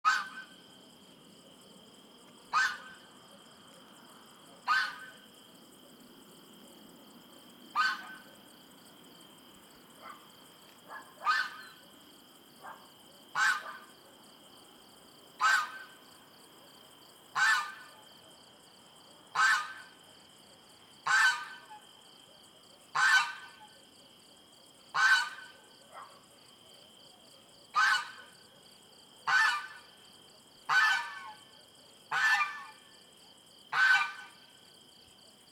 Fowl crowing, barn ambience, cicadas, dogs barking; Sound Ex Machina